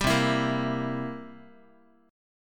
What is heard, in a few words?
C 7th C down Guitar 4A, 4E, 5A, 5D, 5G sharp, 6C sharp; C7 strummed